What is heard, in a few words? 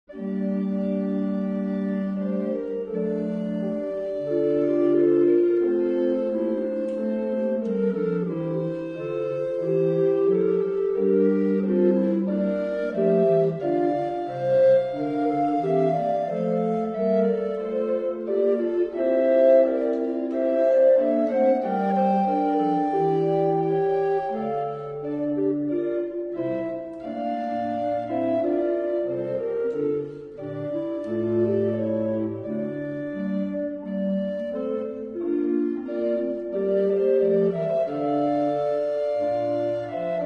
paduan recorder; brade